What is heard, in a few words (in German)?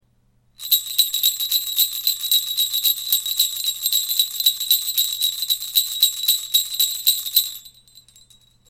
grosse schellen